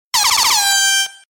airhorn

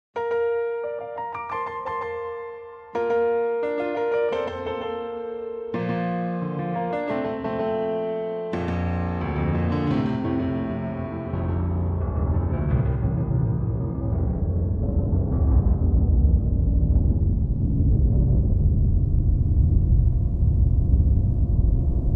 cheers; cave

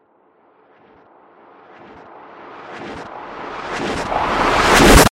gun, reverse

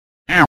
quack, reverse

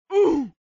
oof

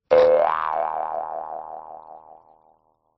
spring

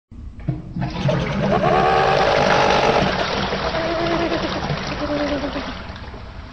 flushed

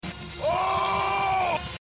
OOOOOH; reverse

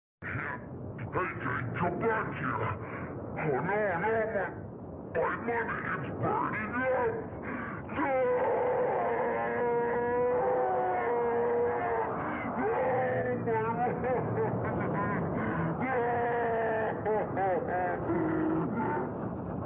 burning money

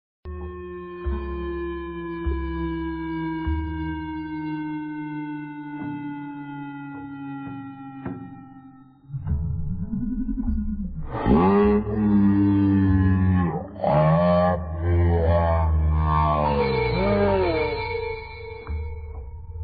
bird; vapesquared

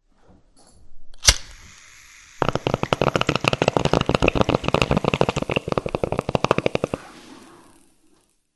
bong

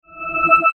ping; reverse

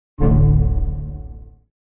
error

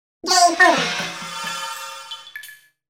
game over

